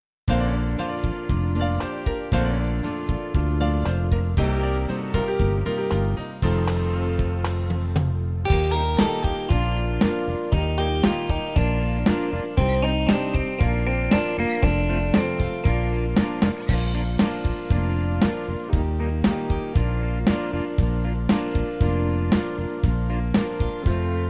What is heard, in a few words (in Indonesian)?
Instrumen